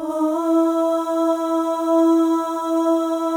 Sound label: OH, FEM, Symphony of Voices